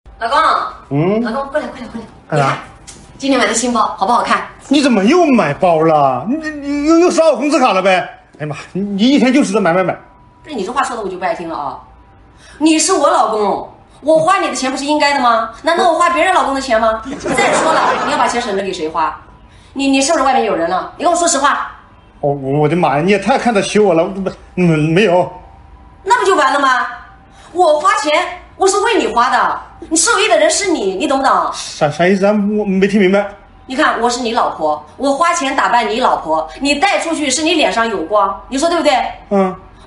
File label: laugh; breadradio